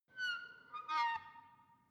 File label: window screech reverberant soft